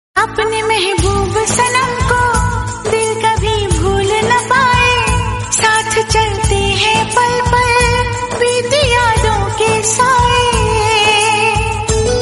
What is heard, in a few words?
romantic hindi song